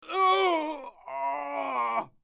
scream pain

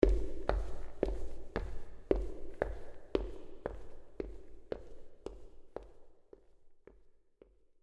footsteps in hallway

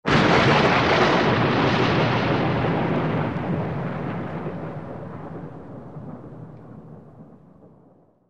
lightening strike

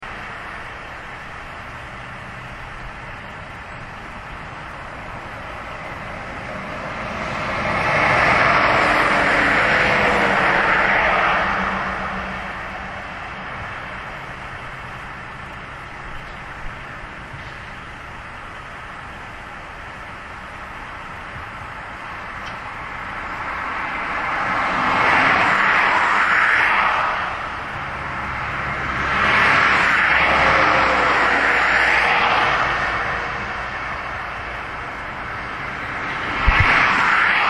traffic moving along

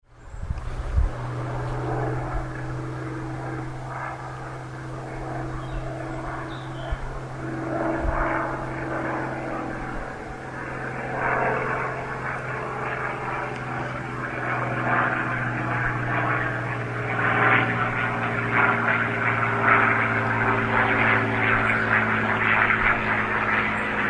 small aircraft

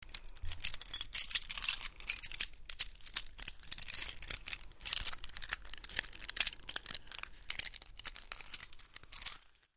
chains rattling

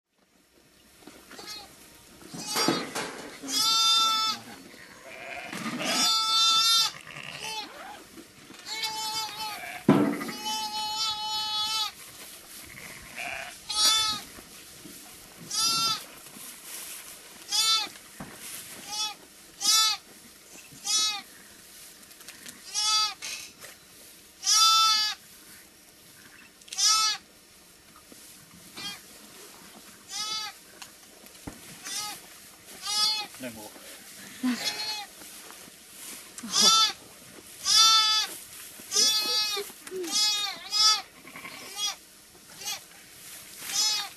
lamb with mother

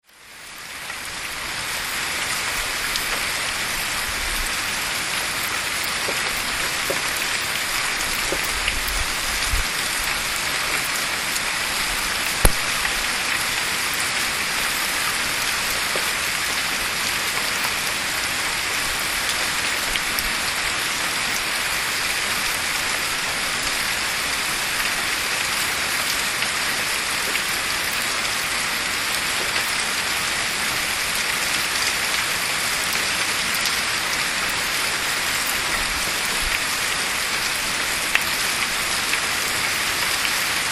rainfall

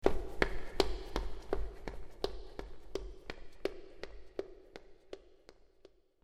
foot running hallway

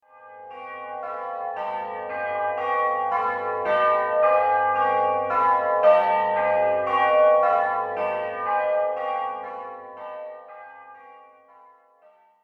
bells wedding

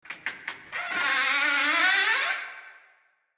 creaking door coffin

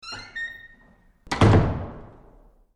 door slam

